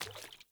Sandals Walk Water, Footsteps; Characters; Player

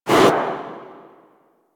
Photobooth Enter Free Camera; Warframe

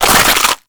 Slingshot Throw; Warframe; Infested; Emissary Ancient Tank

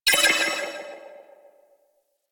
Riot Moa Hack Countdown; Warframe

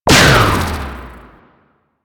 Sickle Heavy Combo; Warframe; Lasrian; Lasrian Soldiers; Weapons; Lasrian Butcher Sickle

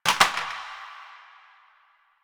Plastic Drop; Warframe; NineteenNinetyNine

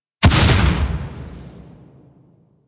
Goo Gun Charged Shot

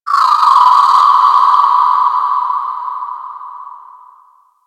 Ocean Underwater Critter Small; Ambience; Warframe; Grineer Ocean

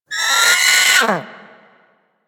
Sea Bird Vocal Alerted; Warframe; Hunting Critters; Ostron Sea Bird

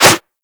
Whoosh, Weapons; Warframe; Furax

